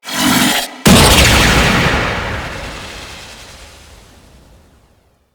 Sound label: Revive Power Boost; Warframes; Tenno